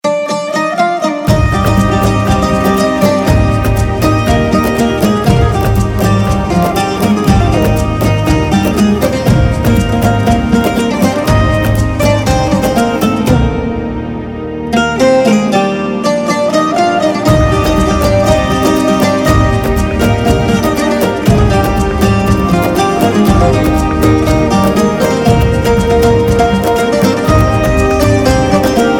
Instrumental Tone Ringtone

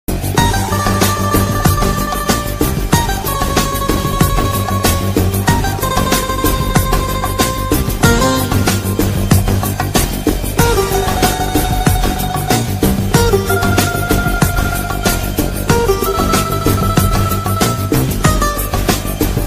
Instrumental

